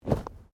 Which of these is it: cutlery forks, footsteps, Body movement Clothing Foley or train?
Body movement Clothing Foley